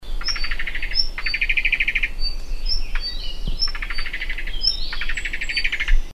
White browed Bush Robin, birdcall; Tarsiger indicus; call (ID confirmation pending); Bird Call Recordings

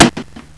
bang

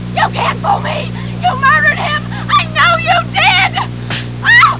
slap

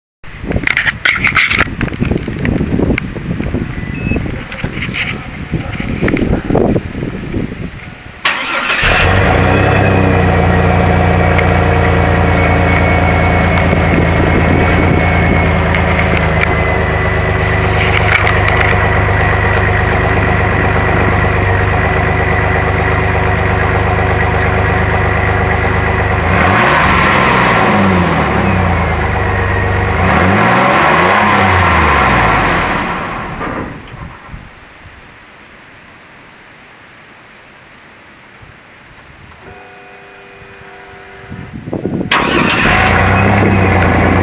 Stock Exhaust Sound, 2003 S-10; IceBreakerG's 2003 S-10

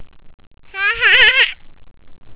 reece laughing

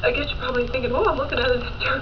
baby

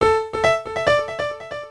piano

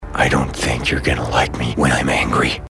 hulk angry; "I don't think you're gonna like me..."; Dr. Bruce Banner; Eric Bana

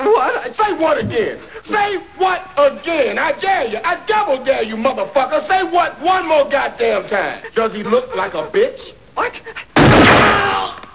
win

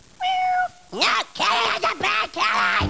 bad kitty

